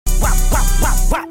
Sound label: wop wop wop